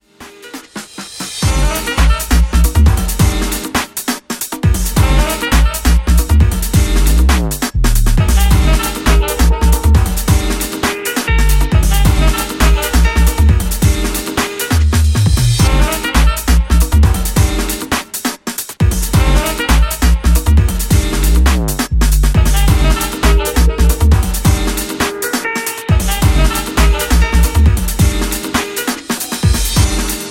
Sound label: Brokenstep Instrumental